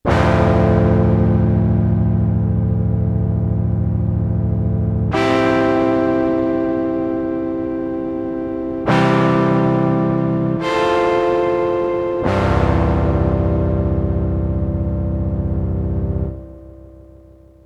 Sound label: Modal Argon8